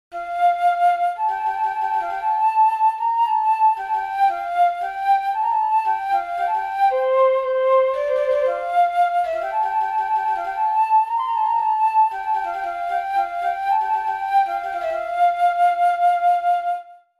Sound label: Trills Aggressive Flute